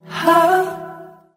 "huh", female sung lite; ooh ahh; heh huh

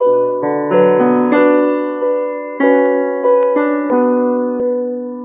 piano, Sad Loops

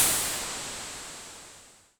cymbals electro

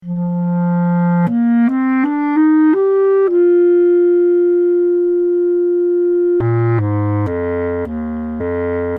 keys alien, sad loops

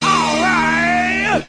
all right, SUNG; expletives

